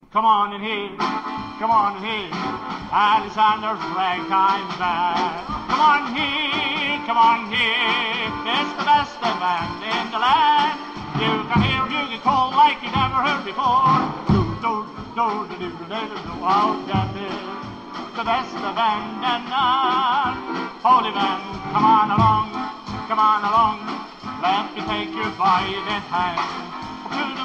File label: Ragtime; oldtimers